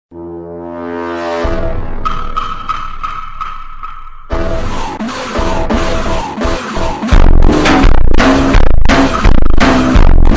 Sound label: Funk super Slowed